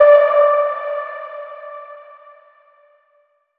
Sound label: Ping Clanger